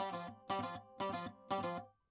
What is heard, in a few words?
saz